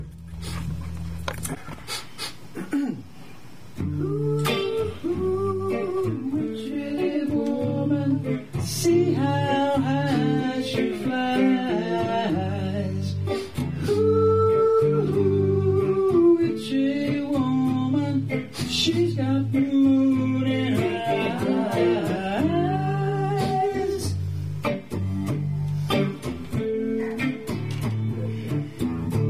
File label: Harmonizing